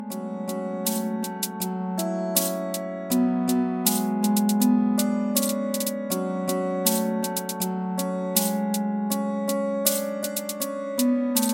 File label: Sad rusty ringtone